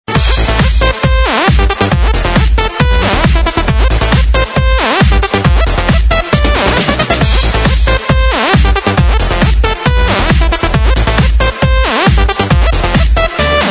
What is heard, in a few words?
Disco, рингтоны